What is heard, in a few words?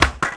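ollie concrete, terrains; Tony Hawk's Project 8; XBOX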